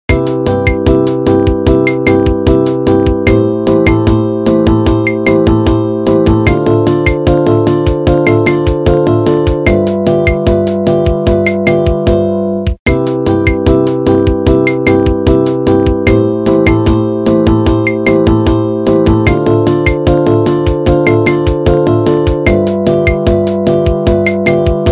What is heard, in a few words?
bossa nova synco theory